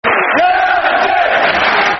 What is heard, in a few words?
United, Football Chant, Soccer Song; Sheffield Utd Sufc